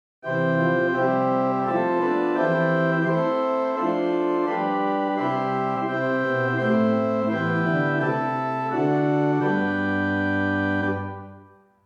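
Great Open Diapason Small 8 + Principal 4, organ stops; St Annes Moseley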